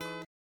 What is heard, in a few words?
Guitar Lick